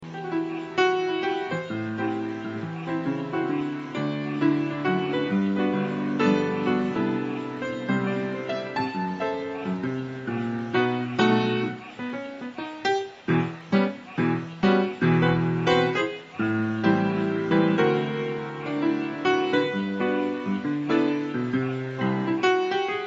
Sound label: musik solo wedding, piano